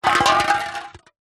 food drink soda can empty knock over concrete ground